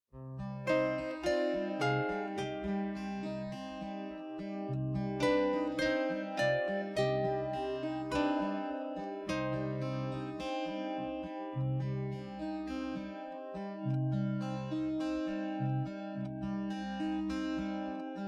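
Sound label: theremin; Фантазии на терменвоксе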